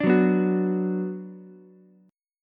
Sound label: F sharp Suspended 4th Sharp 5th, Ukulele; strummed; down